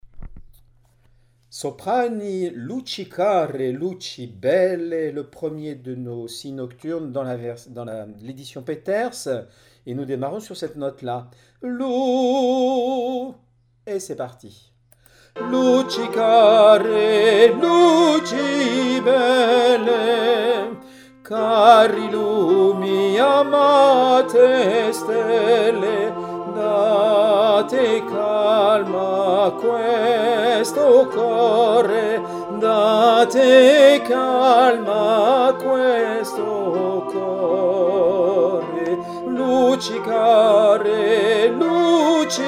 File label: soprano